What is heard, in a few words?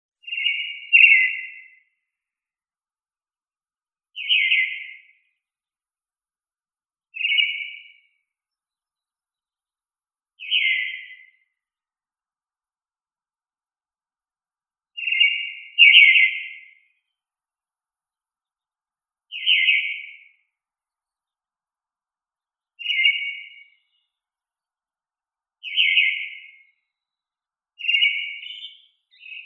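eyebrowed thrush, turdus obscurus; drozd olivkovii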